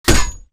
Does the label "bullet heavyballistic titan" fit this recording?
Yes